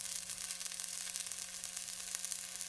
hammer idle

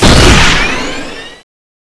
dash; jetgunmb

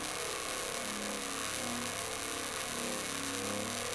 lightning idle, weapons

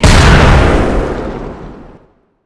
halogun exp

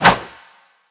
sword bombard fly

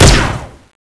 plasma exa, weapons

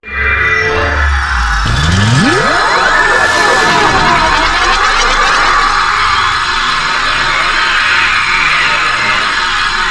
halogun

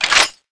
chain mg clip off, weapons

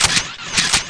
ammo gun shoot, weapons

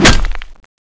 lance wall stone, weapons

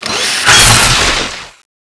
saw stab; Vaas

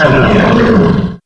wolf die; wolfteam

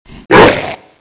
skill poison, zombie giant; dione

